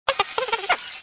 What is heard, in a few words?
mouse go; Star Wars Jedi Knight II Jedi Outcast; XBOX